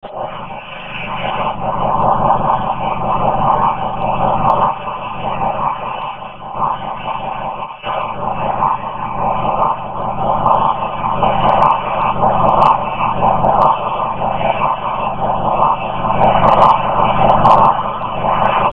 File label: pulsar 1 pulse